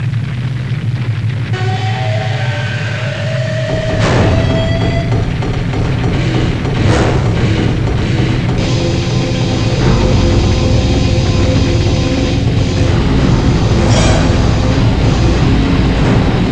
Fire musc; Atari HQ Archive #1; Demo Man; Music

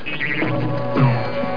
Empty Recycle Bin; Jeannie

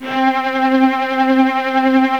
tremolo strings, strings & orchestra; GMTones

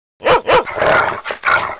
Dog; fun and bullets

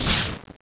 misslnch; aggress

